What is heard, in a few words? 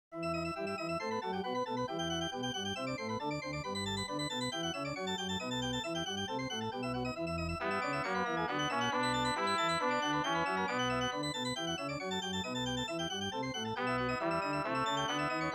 46edo Cornet Organ; Bach BWV4